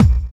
00s natural kickdrum a sharp key, Royality free steel kick drum tuned to the A# note; kick drum samples